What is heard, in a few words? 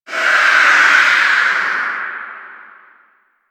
Vocal Pain D, Enemies; Warframe; Priest Quest; Rell Specter